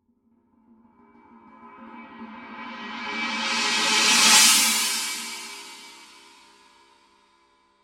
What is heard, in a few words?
PST 5 China Paiste